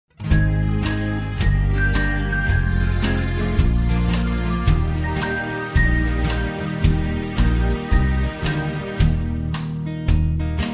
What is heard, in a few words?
(Eb) No Backing Vocals